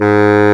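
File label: saxophone G#1; AKAI S-Series CD-ROM Sound Library VOL-8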